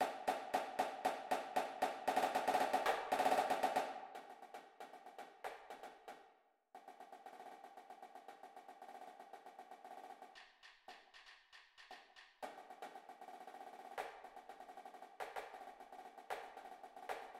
snare

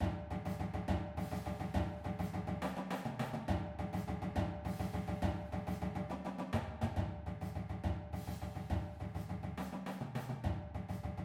beats, drumline

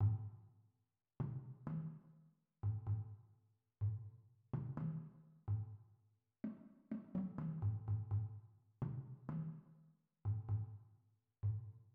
bass

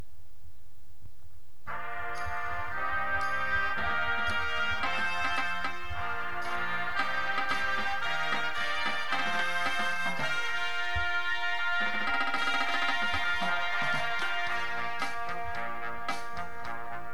tenors with music, drumline

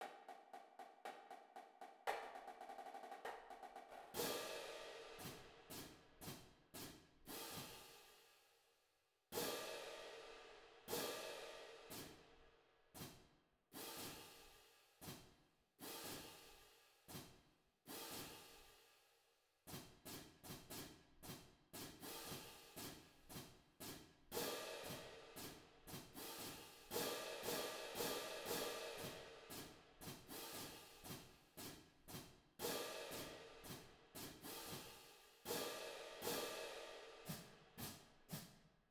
cymbals

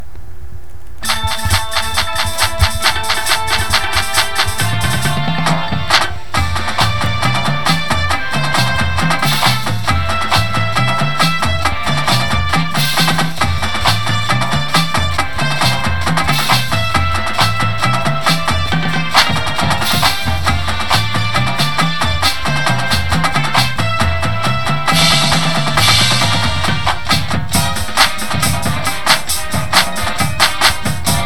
full drumline with music

